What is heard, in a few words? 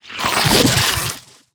Mantis Death